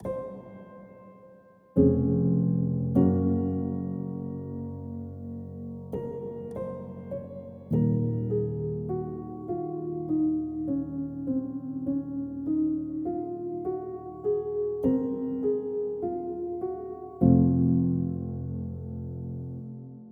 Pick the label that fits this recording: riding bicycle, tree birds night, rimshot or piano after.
piano after